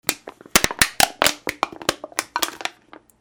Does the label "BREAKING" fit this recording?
Yes